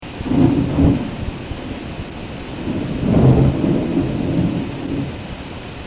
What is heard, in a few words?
thunder